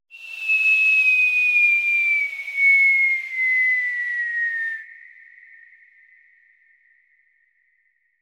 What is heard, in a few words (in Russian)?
svist bomby bez vzryva